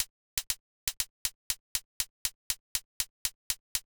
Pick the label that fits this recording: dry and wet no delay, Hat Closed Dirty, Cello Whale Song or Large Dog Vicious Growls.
Hat Closed Dirty